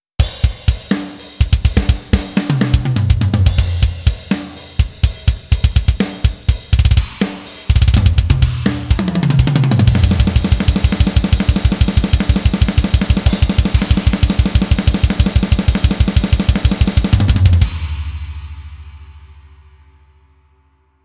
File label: Drums Mixed Extra Processing